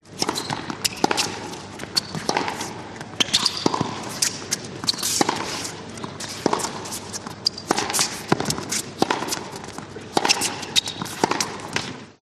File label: sport tennis match rally pro